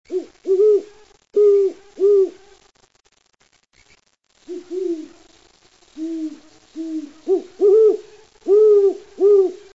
Great Horned Owl

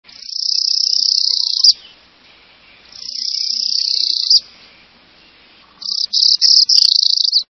Northern Parula

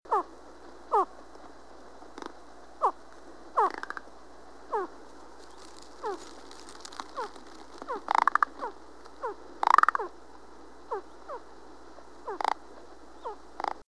Rock Ptarmigan

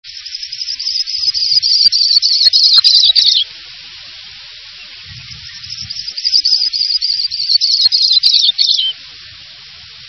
Sprague's Pipit

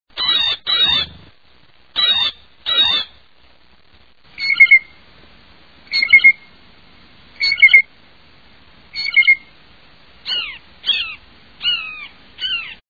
Blue Jay

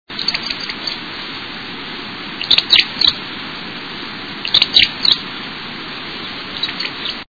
Vauxs Swift

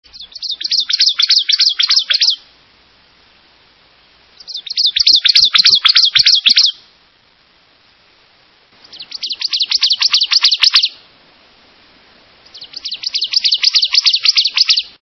Ovenbird

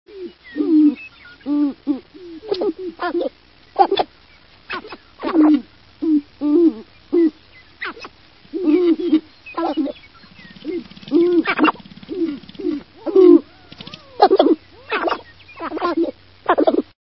Sharp tailed Grouse

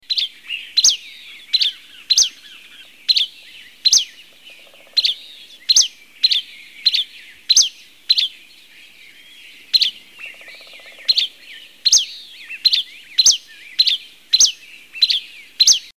Buff breasted Flycatcher

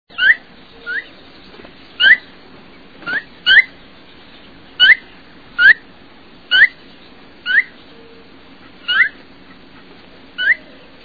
Phainopepla, songs

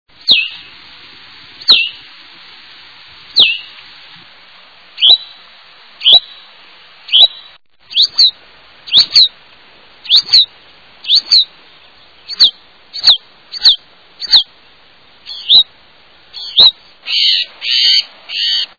Huttons Vireo

